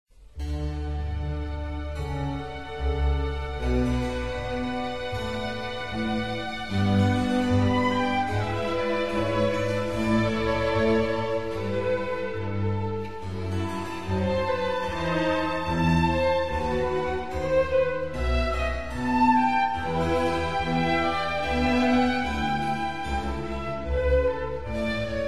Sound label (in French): Suite pour orchestre; Aria; Bach